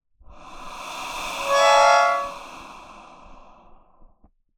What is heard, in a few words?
Wind, Melodica